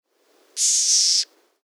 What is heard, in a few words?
Black-tailed Gnatcatcher Snarl